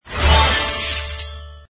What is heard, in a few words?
coins; plinko; Brazil